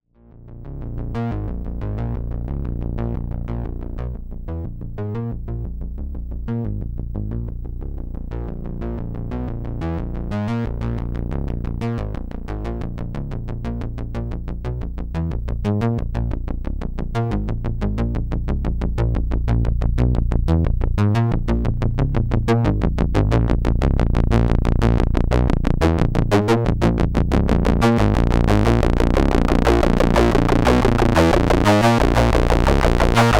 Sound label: Tube Filter; RozzBox V2